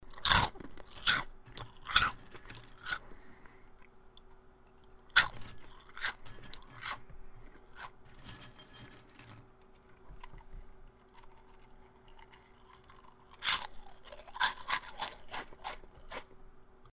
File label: eat, Foley; TRUTH-HZ; Crowdsourced Sample Pack